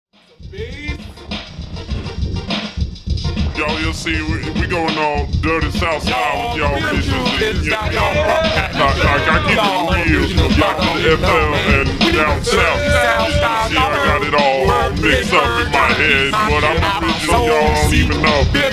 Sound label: machine drum